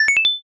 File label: asia xylo